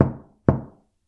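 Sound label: knock on wooden wall; knocking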